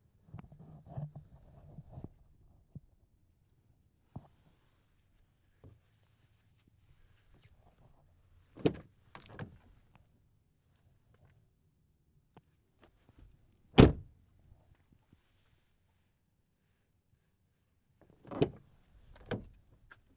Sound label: Car Door Opening And Closing